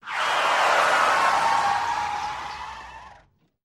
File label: Solo Spin, Car Tires; Ambient; zps